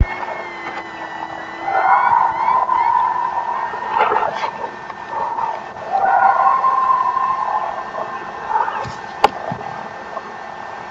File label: Big Foot Yells